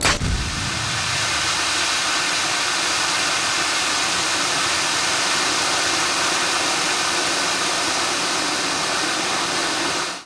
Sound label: gas explode, grenades